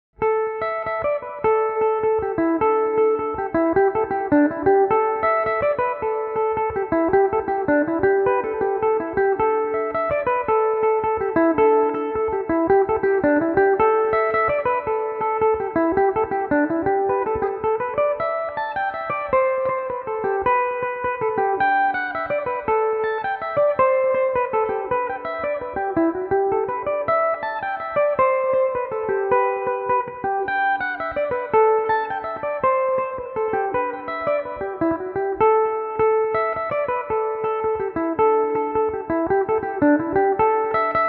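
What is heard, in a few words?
A dorian, irish mandolin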